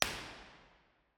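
soundfield, impulse response, St Patricks church Patrington; b-format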